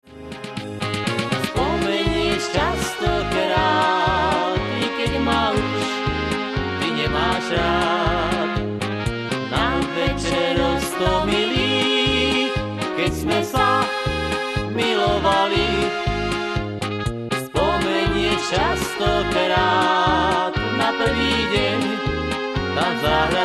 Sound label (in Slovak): akord